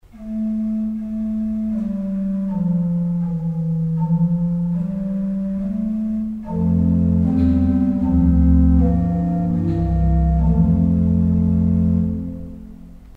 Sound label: Borduna 16; urkutieto